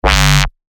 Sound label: bass shot G; Dullard; revealed bass shots vol 4